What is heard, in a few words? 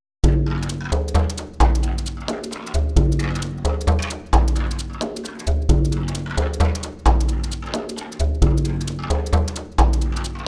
Buffalo Drum; Buffalo Drum RMX